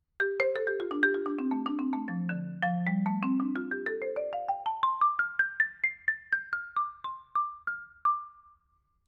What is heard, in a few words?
marimba; baguette vibraphone benoit lavollee jazz